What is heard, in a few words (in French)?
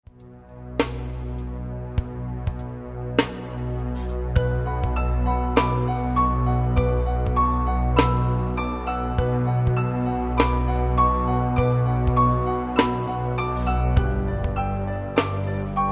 voix Celestes